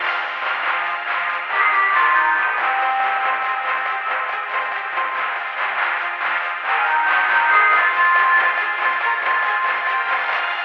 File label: find opponent search music loop; hearthstone